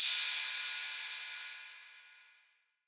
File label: crash stereo electronic, DRUMS (SINGLE HITS), Crashes